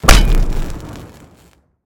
hit; smite; chaac

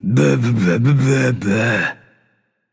nv tongue; smite; voicepack; hachiman

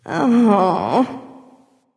"ugh"; smite; voicepack; nike

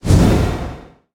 roll intense; smite; god geb skin7

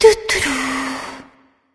sigh; smite; voicepack; ratatoskr

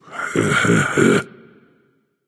heh heh, voice; Smite; ymir skin14

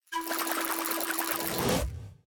marble; smite; god merlin

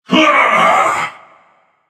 yay; smite; thanatos

